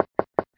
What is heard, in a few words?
ICQ Contact Alert; TU500; LG